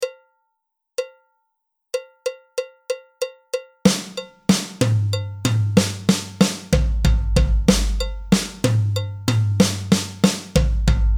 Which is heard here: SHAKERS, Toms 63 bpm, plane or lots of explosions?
Toms 63 bpm